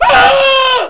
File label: SCREAM